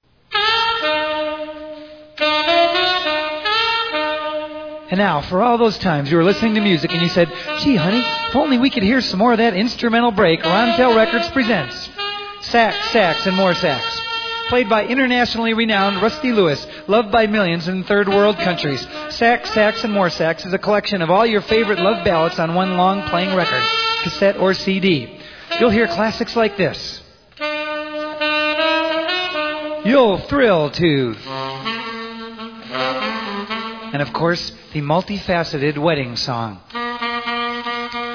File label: Sax Sax and More Sax